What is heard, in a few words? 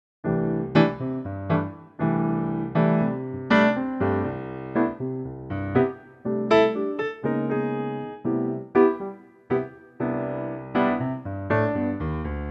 piano C; Pianotrak; key - C - vocal range - F to G; Piano only arrangement of the song that sparked the Bossa Nova revolution!